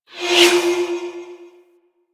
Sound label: Spirit Primary Fire Whizzby B; Warframe; Grineer Thumper Bot; Sentient Spirits